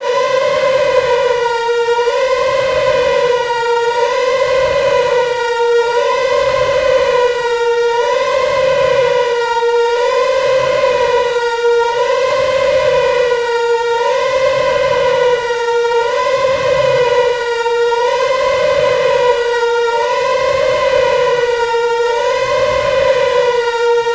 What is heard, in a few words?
scary nuclear war siren; bass boosted